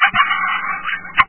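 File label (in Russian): поцелуй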